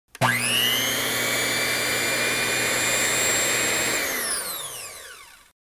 Mixer Speed 5

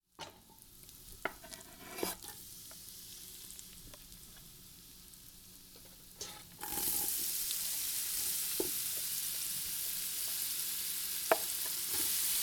Frying Sounds

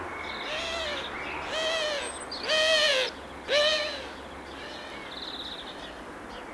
Collared dove fly by

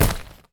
Wet Plastic Hit

